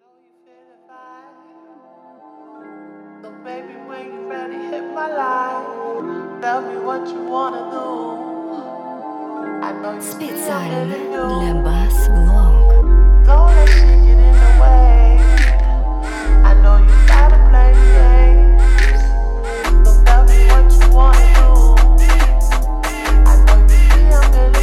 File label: 41-46Hz